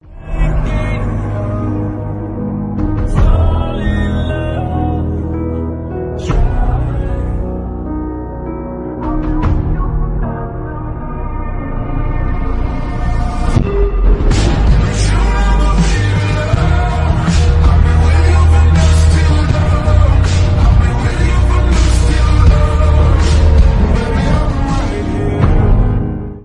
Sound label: kick sub